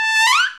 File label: Trumpets; Club-50 - Foundations Roland